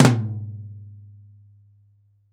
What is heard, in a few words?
RAW TOM, Drums Acoustic; AKAI; 90s sample CD; USB Soundscan vol.10; RAW KIT 7